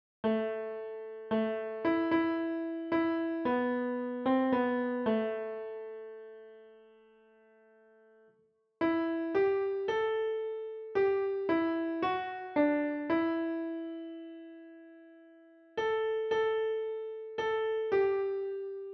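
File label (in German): Scarborough Fair Klavier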